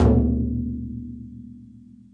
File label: hirado, Japanese drums; ETHNIC and WORLD PERCUSSION